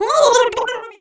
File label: Drown; drowning in water; Link drowning in water; zelda